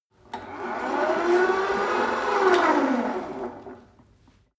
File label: rope trainer pull sequence medium short, fitnessstudio; sport freizeit